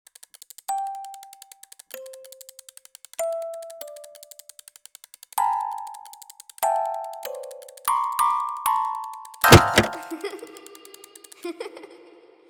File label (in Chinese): 自走八音盒